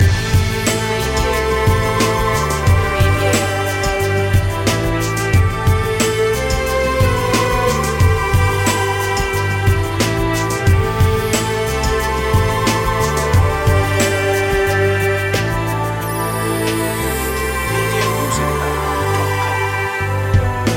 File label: concrete chill loop